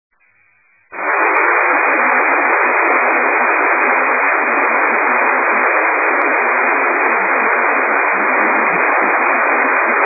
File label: light rain